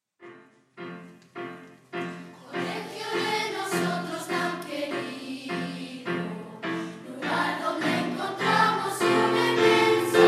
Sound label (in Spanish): Himno voces blancas; Himno Divino Maestro